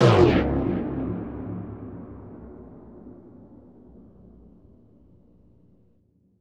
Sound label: sub drop A# minor